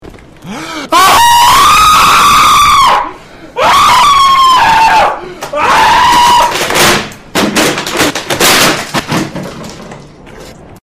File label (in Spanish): Grito